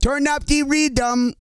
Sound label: REGGAE, Vocal Planet World Voices